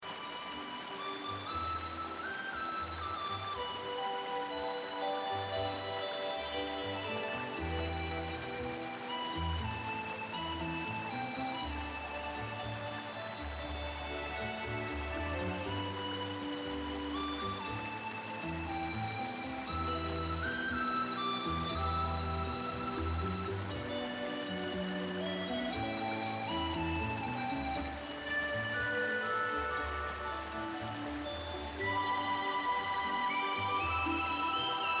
rained bgm

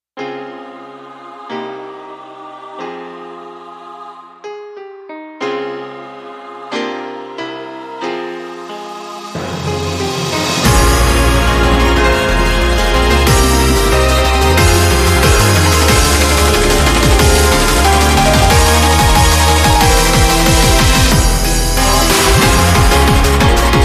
boss battle

